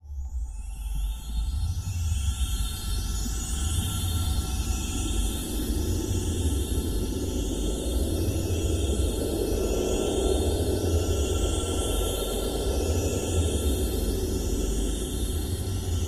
Nothing distant low pulsing with angelic chimes, gradual rise in pitch falling back to distant ambient pulse